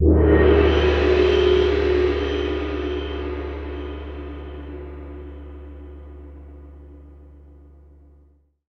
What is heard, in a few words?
GONG MF; ILIO; Synclavier Percussion 2; World & Orchestral